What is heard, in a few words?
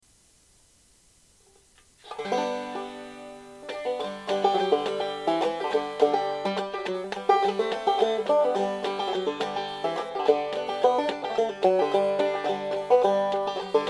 strumming on the banjo